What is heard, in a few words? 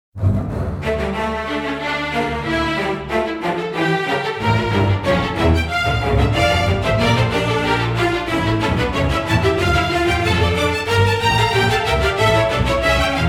Adventure Strings; Musical Sampling